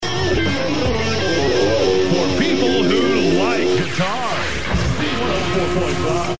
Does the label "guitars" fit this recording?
Yes